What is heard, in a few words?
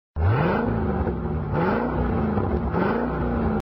motor start up; Grave Drive